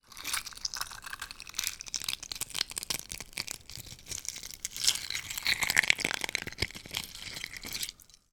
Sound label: raw egg and shell movement crush in bowl